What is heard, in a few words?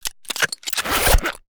locks and zippers; soundscrate